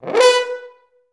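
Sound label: french horn B3; Best Service; Peter Siedlaczek's Advanced Orchestra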